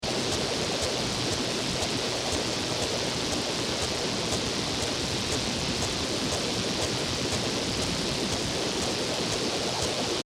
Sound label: No Signal 800kHz